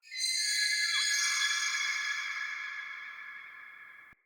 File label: Day Texture, Ambience